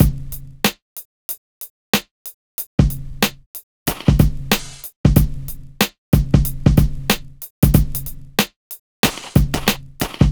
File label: Drums, Hip Hop